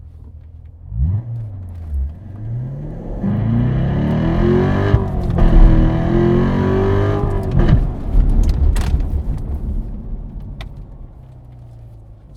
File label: corvette 0-120-0, Corvette C7; Chupapsound